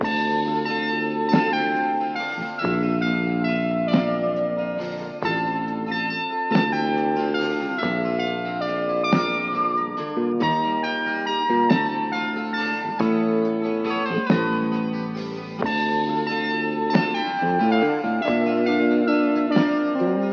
keys synthy, Sad Loops

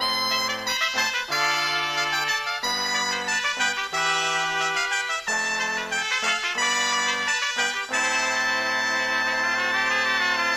horns salsa, phonky loops

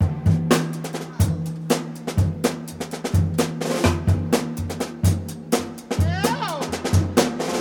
boogaloo, Drum loops, Funky

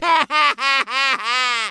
laugh cartoon

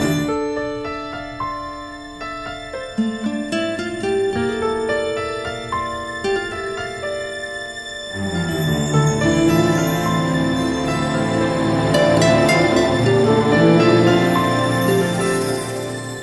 piano, Loops, Sad

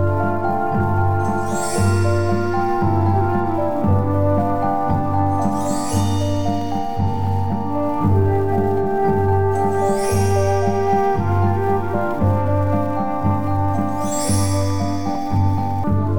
flute

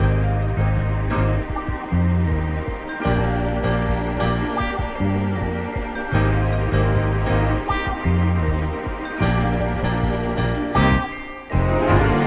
piano, Phonky loops

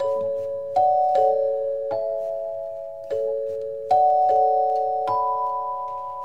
keys heroic, sad loops

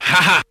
ha ha, ooh ahh; Voice

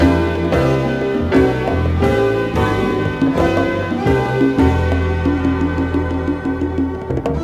horns, jazz loops

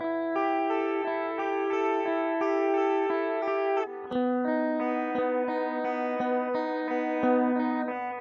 guitar, Sad

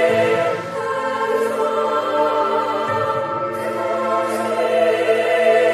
voice; Sad

